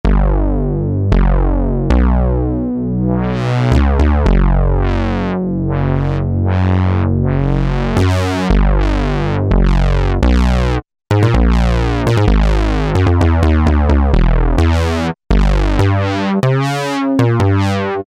BS Analog Bass; Roland L-CDX-01 Rhythm Section Instruments

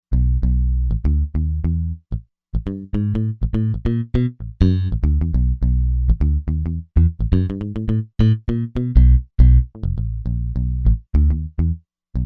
Mute Bass, Roland Fantom XR

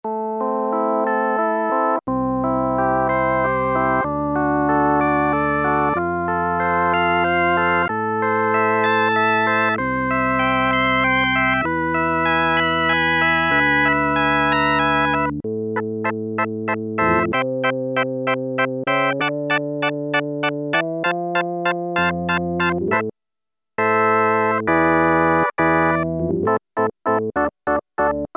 Combo Organ, Roland Juno-106; Factory Preset

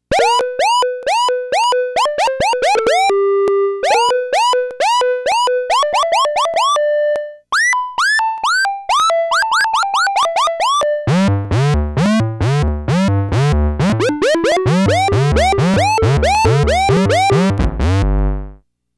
Comedy Comp

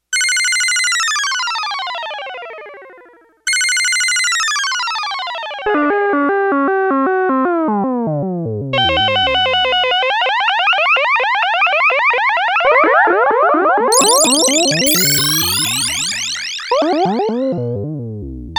Descending Pulse Wave Mod

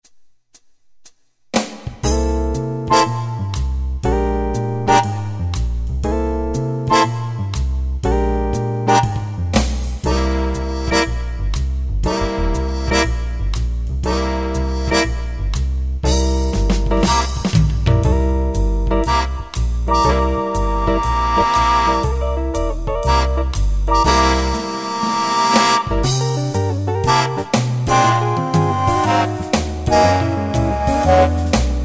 Big Band Jazz, Yamaha QY70 Preset Styles

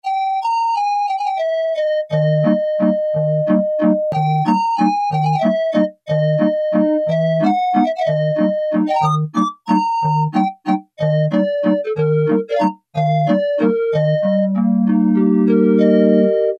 CALIOPE

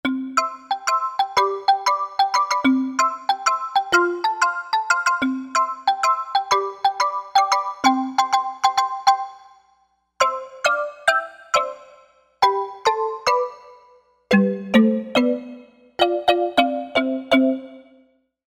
Mambo Marimba, synth

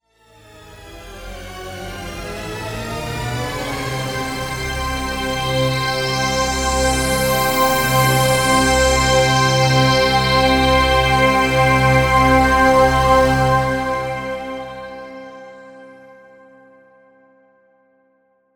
ORCH EVENT

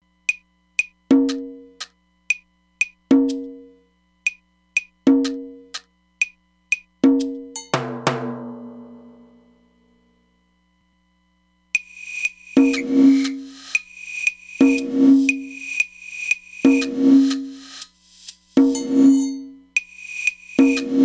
Inverse Room; Roland DEP-5; Factory Patches

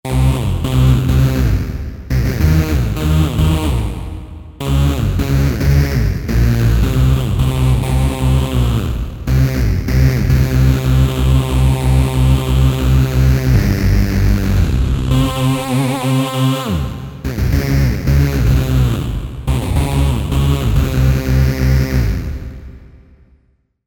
Bass Hoovr

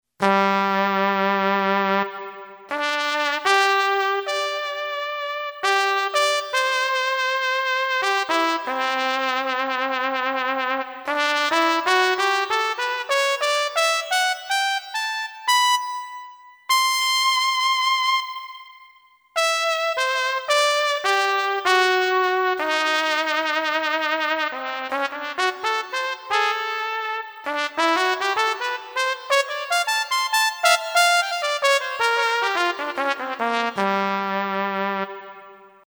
Sweet Trumpet; Yamaha PSR-550; Voice

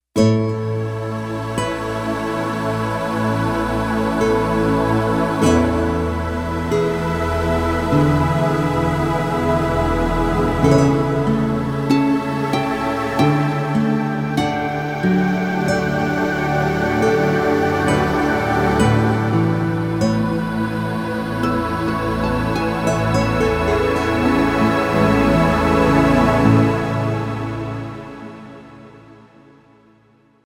BALLAD, synth

